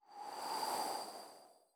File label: Mouth Blow Medium